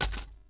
blaster hit concrete; objects; Star Wars Obi-Wan; XBOX

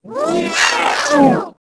droid die

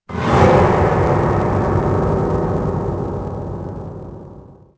teleporter; AMBIENT; Pirates Of The Caribbean; INCA TEMPLE

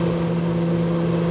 micro run; Soldier Of Fortune II Double Helix; XBOX

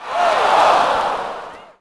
NEG Reaction,Crowd; Cars; XBOX

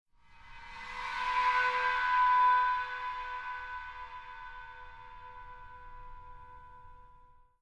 theme stinger; Myst III Exile

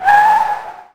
Menu In, UI; Cars; XBOX

